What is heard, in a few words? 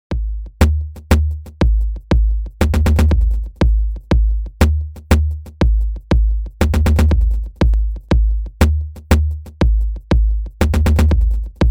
clubmusic hongkong conch; warwick